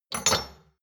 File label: shot glasses stack medium; berufe; gastronomie; soundpool